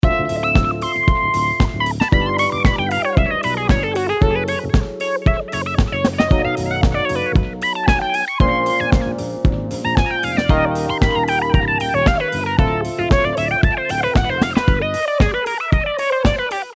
Mediant Cadence; Motion6

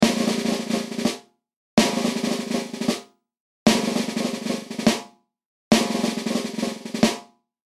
Roll

